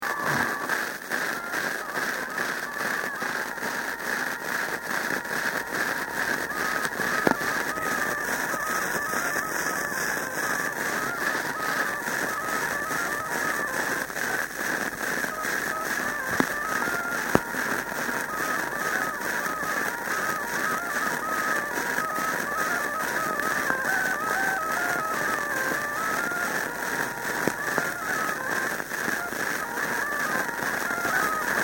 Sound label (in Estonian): Kassari Kuusalu torupill folk